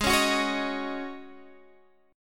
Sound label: G sharp 7th Suspended 4th G sharp, Guitar Standard; x,11,11,11,14,11; strummed; capo=3